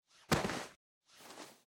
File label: whiteout